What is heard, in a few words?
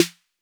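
Roland 808 snare drum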